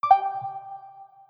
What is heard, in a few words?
new message; session-desktop